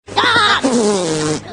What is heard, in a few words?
combo burst; minionalist